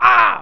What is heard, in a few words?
Henchman Ah Dying; Playable Tutorial Level; TR2